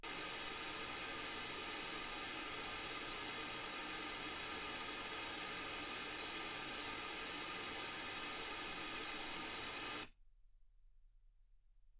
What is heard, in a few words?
pink noise 48kHz